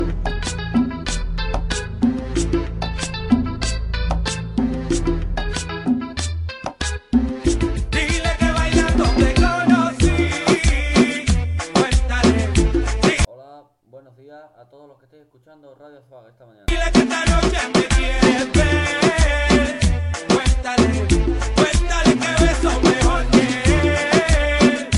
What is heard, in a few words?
festival reggaeton